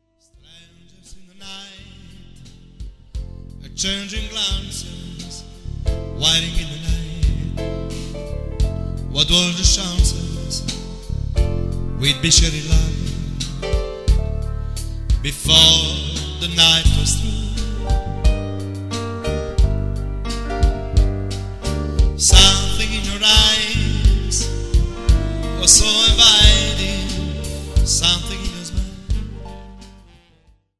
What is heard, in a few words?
PIANO BAR